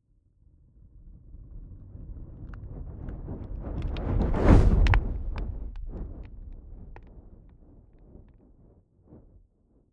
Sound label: fire whoosh crackling; swooshes-swishes